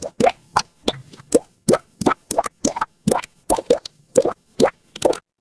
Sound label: water drip